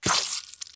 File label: whack; game